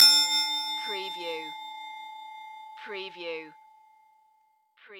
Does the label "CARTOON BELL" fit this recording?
Yes